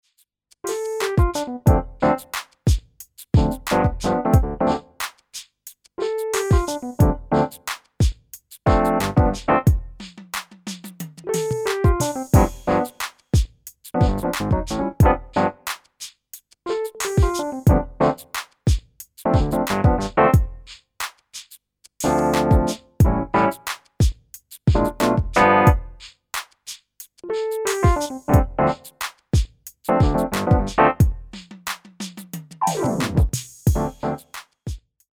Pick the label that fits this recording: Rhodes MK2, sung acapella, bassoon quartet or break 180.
Rhodes MK2